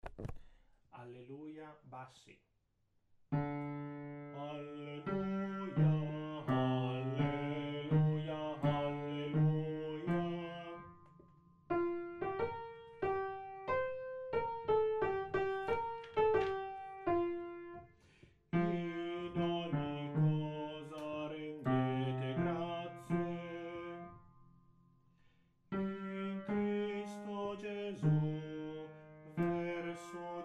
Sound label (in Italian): bassi; padova 2013; alleluja